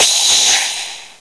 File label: freeze